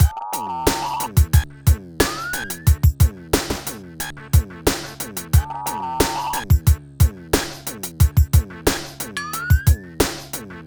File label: Beat, Hip-Hop